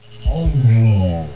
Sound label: hungry monster